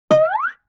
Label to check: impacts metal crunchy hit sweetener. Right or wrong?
Wrong